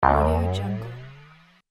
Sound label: jumping game sound; bekibeats